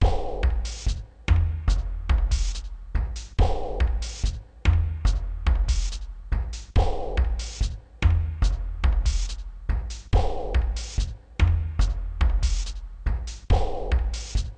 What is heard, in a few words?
Tom slow